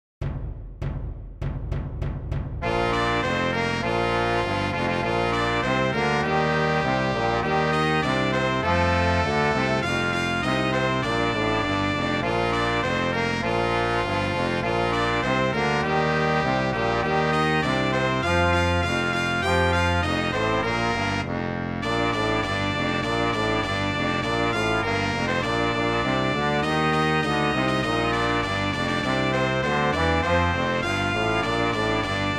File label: Jingle Bells; Nadal; Repertori CBT